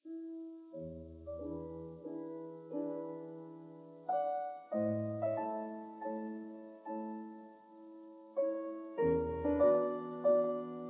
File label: Prelude No. 7 in A major; F. Chopin; 24 Preludes, Op 28